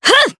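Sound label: Vox Attack Japanese; Nicky; kings raid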